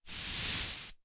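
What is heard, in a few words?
Air swish long right to left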